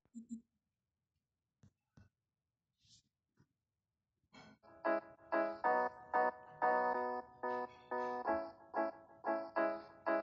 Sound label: Instrumental; BVB